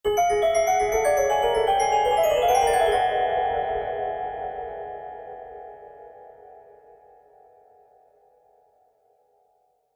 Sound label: Piano Dark Eerie, Piano Dark Eerie Glockenspiel; Musical; Pianos; Blastwave